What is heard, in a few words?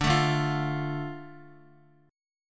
D Minor D Guitar; Dm strummed; x,x,2,2,2,1; JGuitar